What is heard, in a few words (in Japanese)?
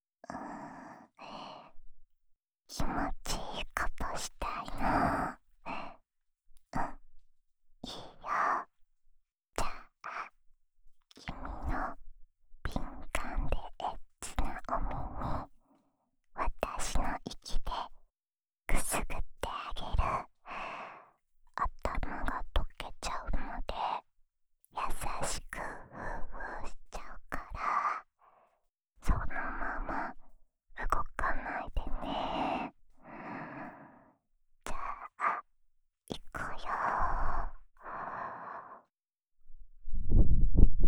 お耳ふーふーループ（左耳）, ASMR; おま○こ係２